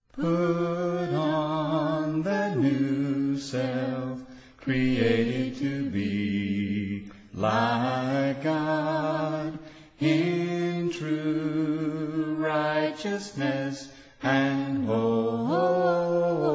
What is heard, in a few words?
a capella hymn